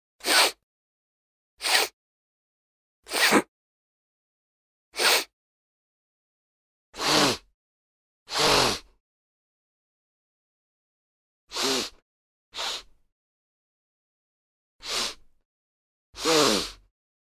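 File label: runny nose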